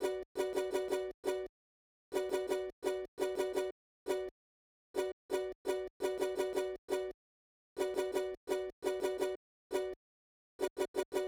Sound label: Charrango, 085 Bmin